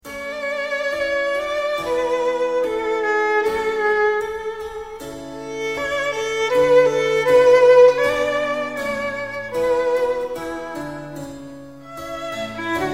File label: Violin Sonata In A; Handel; A大調第5號小提琴奏鳴曲; 第1樂章